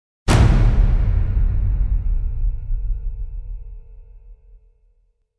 Red Battle Slam; Gothic Storm Music

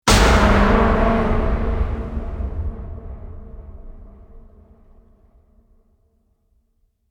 Abandoned Hit Metal; Ninja Tracks

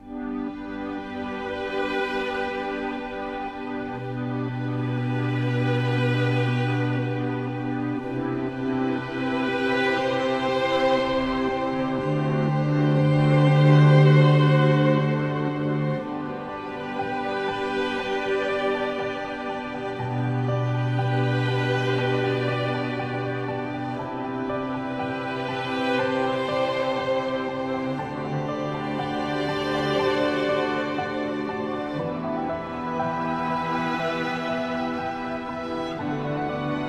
Sweethearts Ambient

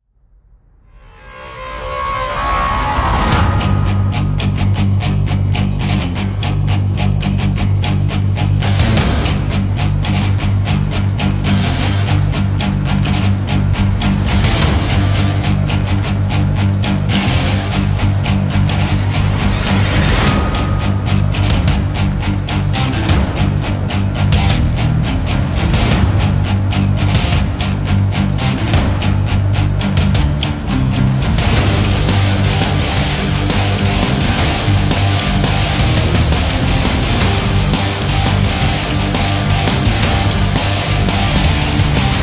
Band Only; Gothic Storm Music

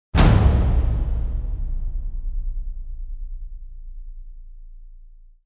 Assault Slam; Gothic Storm Music

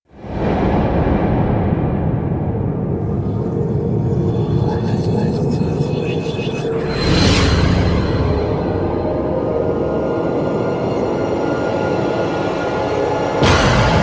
Rise Space Cave; Brand X Music; Brand X Music Catalogue - Editor's Essentials

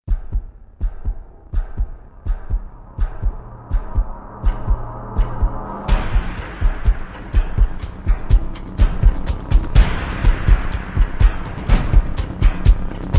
Drums Only Version

